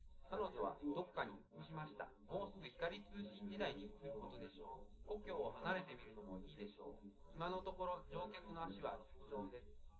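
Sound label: male, live recording 250ms 1m; SiSEC11; underdetermined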